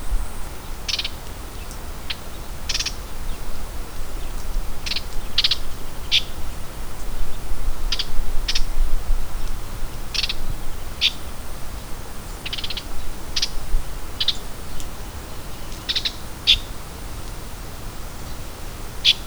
Common Yellowthroat; Andrea Cornelius

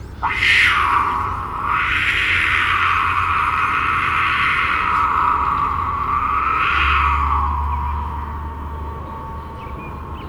Cougar; Park

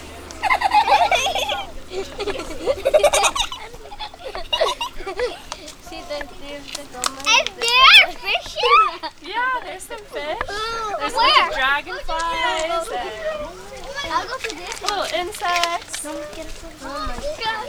Kids Crossing the Creek; Andrea Cornelius

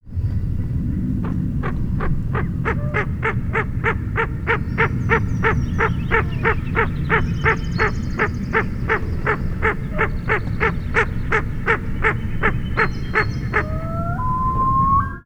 Ring Necked Duck

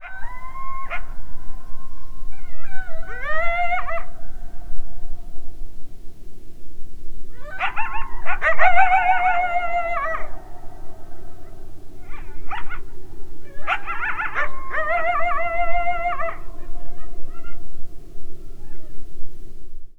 Coyote Call; Park

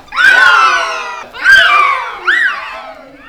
Kids Red-tailed Hawk; Park

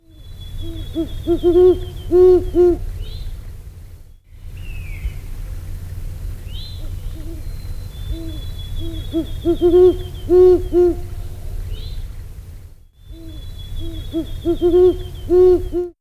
Great Horned Owl

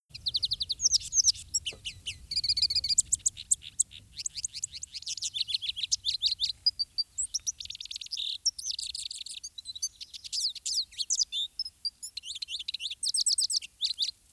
Lesser Goldfinch

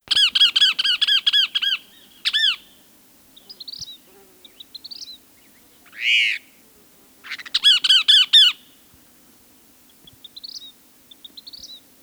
Kassin's Kingbird

